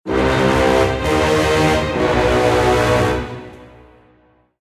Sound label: Cinematic Zombies; Ghostbusters